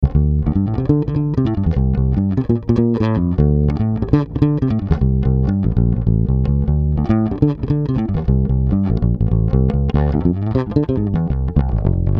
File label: Tierra Audio Gravity VCA bass hpf atk slo rel